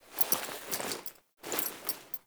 Foley Action Acorn Pickup; Characters; Player

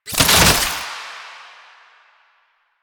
Soldier Zipline Stop; Enemies; Soldiers; Warframe; Lasrian

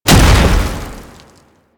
Tank Infested Strafe End, Movement; Warframe; Lasrian Tank

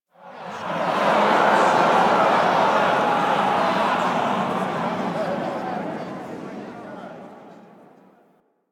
Amphitheatre Crowd Panic; Ambience; Duviri; Warframe

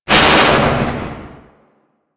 Space Pod Random Thrust; Grineer; Vehicles; Warframe

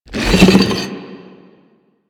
Arm Drag; Warframe; Man In The Wall

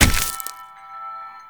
Destructible Plant B Burst C; Warframe; Ambience; Grineer

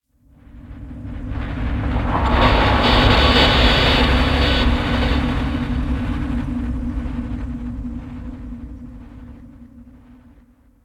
Cave Head Display Static; Ambience; Warframe; Stalker Cave

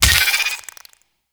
Infested Burst Rifle Headshot, weapons

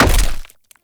Blunt Heavy Impact Flesh; Weapon Impacts; Player; Combat

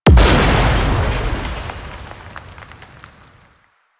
Car Explosion; Warframe; Ambience; Nineteen Ninety Nine

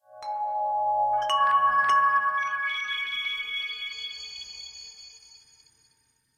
Chimes; Warframe; The New War; Narmer Outpost

